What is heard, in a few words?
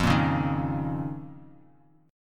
F 7th Suspended 4th Sharp 5th F down Bass Standard; F7sus4#5; strummed; JGuitar